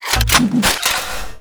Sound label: rearm; tinker